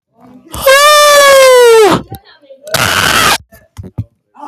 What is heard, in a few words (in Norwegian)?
skrik